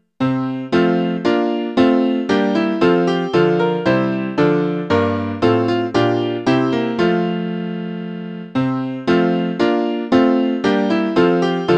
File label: I Sing the Almighty Power of God, piano